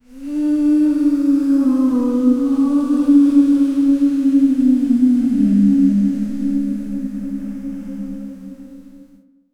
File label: group of women imitating, ancient